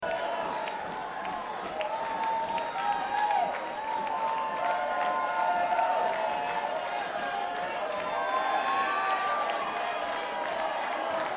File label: Crowd